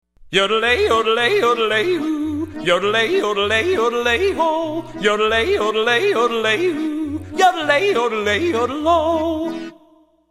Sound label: Alpine Yodeling